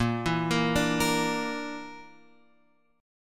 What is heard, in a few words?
A sharp Major Flat 5th; A#Mb5 Chord {6 7 8 7 X 6} arpeggiated